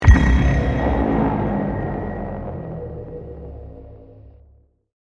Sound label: Bell Fall Impact